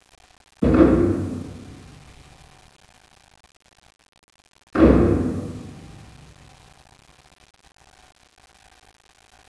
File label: taiko; 新宮神社